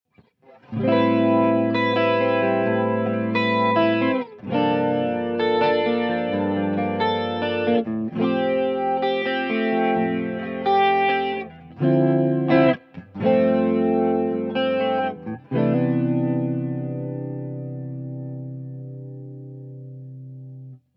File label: Boss DC 2 SDD 14 Mono